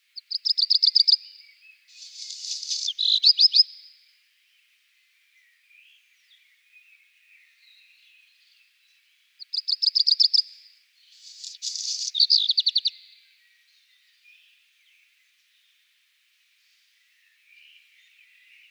Phoenicurus ochruros

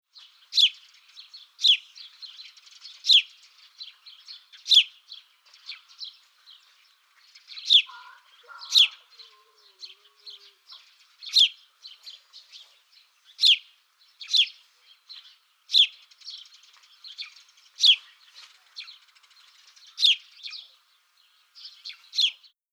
Passer domesticus

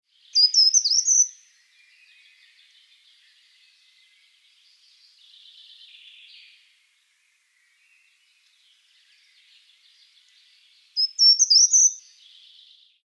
Certhia brachydactyla

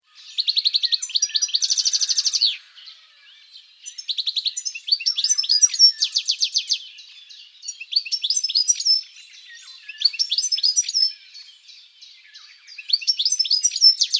Carduelis carduelis

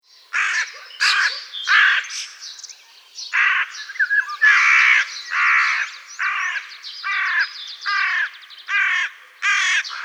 Corvus corone cornix

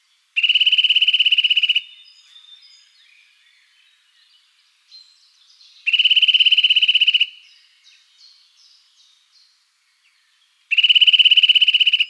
Sitta europaea

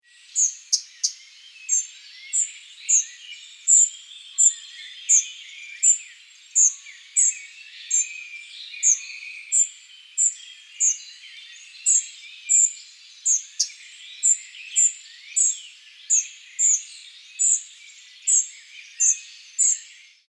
Muscicapa striata

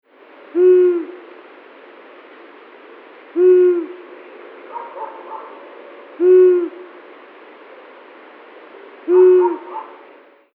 Asio otus

